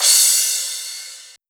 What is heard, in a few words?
small reverb cymbal drum sample D key, Royality free cymbal tuned to the D note. Loudest frequency: 7177Hz